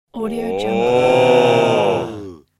male group admiration shout

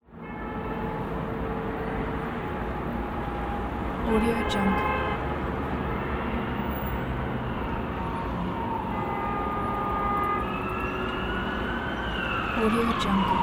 ambulance siren

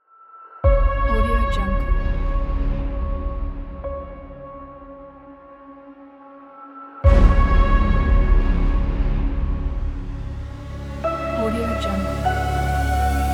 action atmospheric cinematic trailer teaser

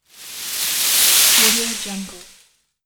drag swish branch on leaves

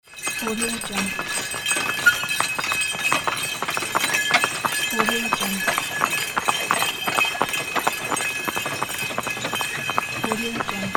horse drawn carriage with sleighbells

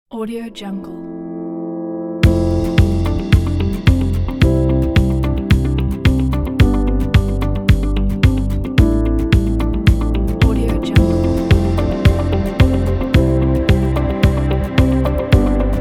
soft relaxing inspiration; voodooaudio

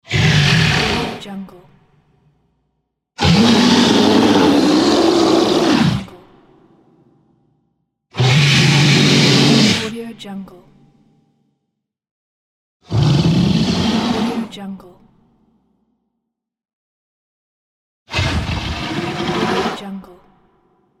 large monster grunt; icesound

